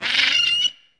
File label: gull; Boston